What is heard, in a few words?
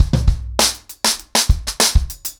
100BPM, ACOUSTIC DRUMS